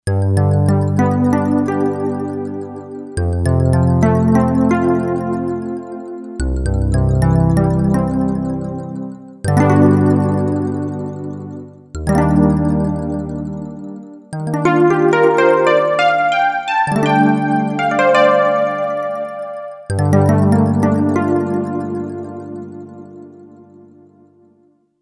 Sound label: Ice Rain, Roland SC-880; Factory Tones